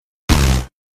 teleport; dio; the best wrrryyy

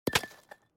barks and dogs sound mixed slap

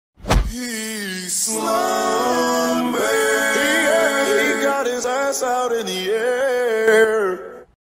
slumberrrrrrrrrrrrrr

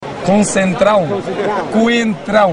laughing; cristiano ronaldo

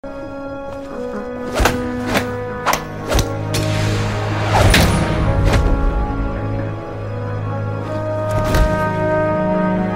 handshake; metal gear solid 2; snake and otacon; philanthropy; mgs2sol

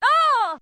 storm

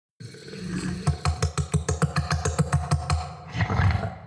clicking monster

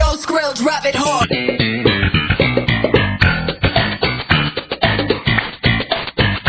one man choir

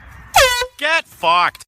goose

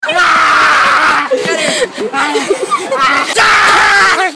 aaaaaaa

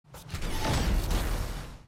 shockwave sfx; nfs rivals beta

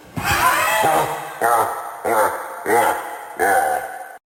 laughing; sonic exe

